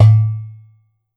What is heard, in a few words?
drum, mixed drums, World Instruments; Best Service ProSamples vol.52 - World Instruments 2; AKAI